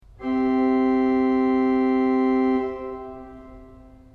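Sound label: octava 4 C, organ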